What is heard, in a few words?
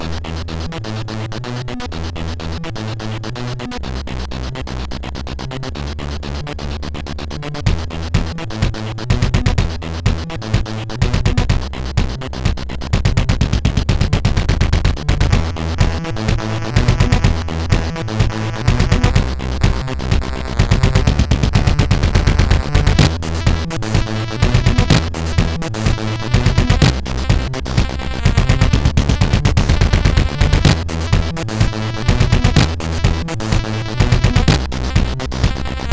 Amiga version sid MOS6581R3